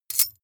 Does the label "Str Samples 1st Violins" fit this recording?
No